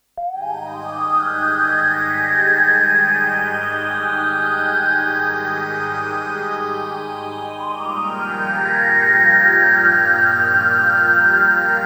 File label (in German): Opus 3 Orgel Filter und Phaser